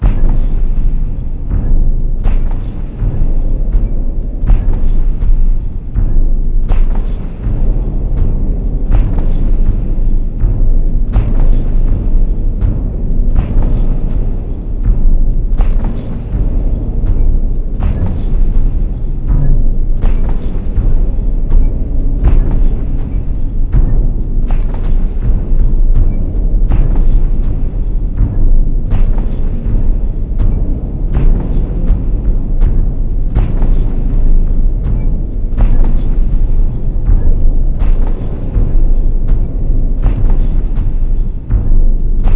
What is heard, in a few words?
Music Ambient; nosferatu the wrath of malachi